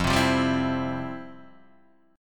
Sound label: F Major 7th Suspended 2nd, F, down, guitar